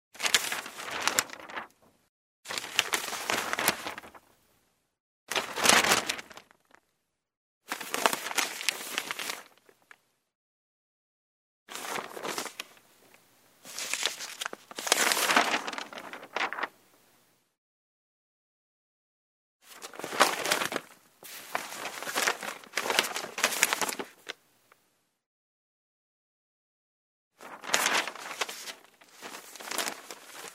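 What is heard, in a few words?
rustling of newspaper pages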